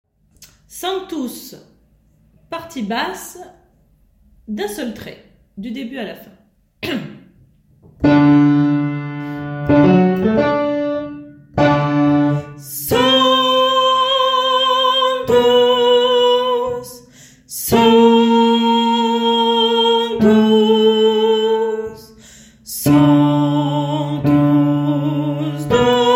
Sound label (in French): partie basse en entier; Sanctus